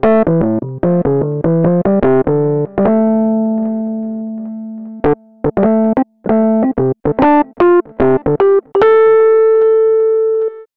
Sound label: Rhodes Solo, Cinematic Funk; Cinematic Funk Urban Tone Poems; Xotica